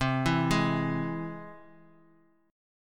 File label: C Augmented down; arpeggiated; JGuitar